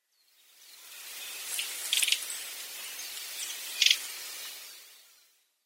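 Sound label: Yellow-rumped Flycatcher; mamijirokibitaki; koe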